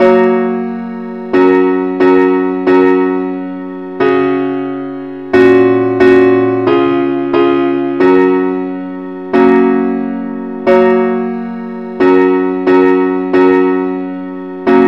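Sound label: parts only key F sharp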